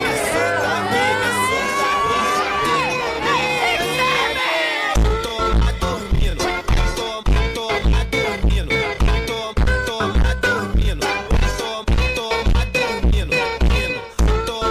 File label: phonk; meme